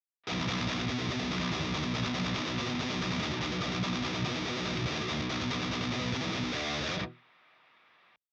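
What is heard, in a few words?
bridge dist, electric guitars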